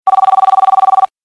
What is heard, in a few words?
normal ring